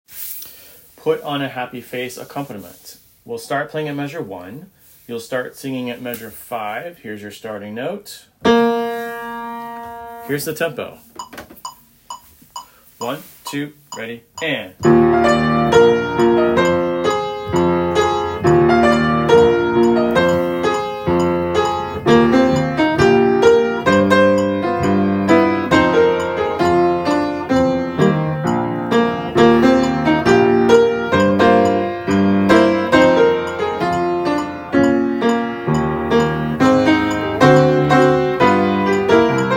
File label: Accompaniment